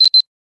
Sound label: Timer Beep; huawei